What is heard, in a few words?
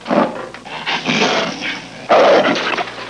Leopard snarling and roaring